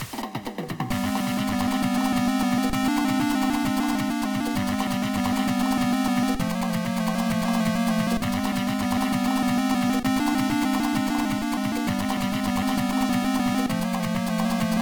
Mini-Game Theme

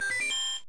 Message Block

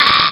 grunt; Krunch

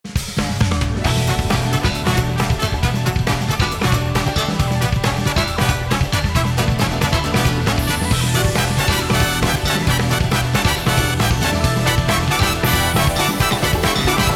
Arrangement; Bowser's Galaxy Generator

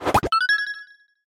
Item Equip

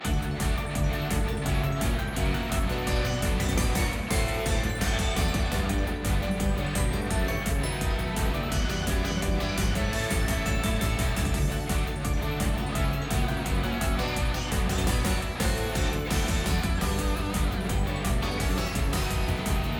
Temple Indoor; Roshambo

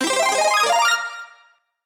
Power Up; SM3DW

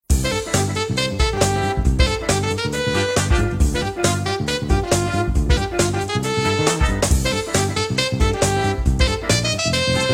Overworld Hurry Up Theme

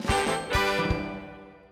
New Card Get

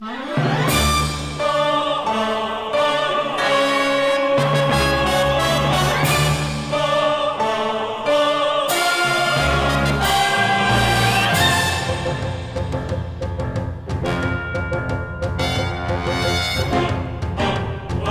Fated battle

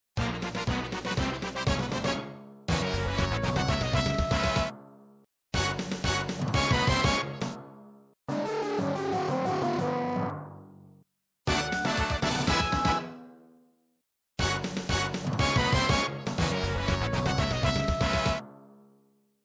Jingles; Dribble and Spitz; WarioWare Gold

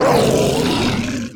Bowser Roar

